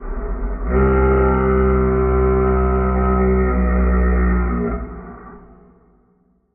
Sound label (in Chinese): 山羊角吹奏声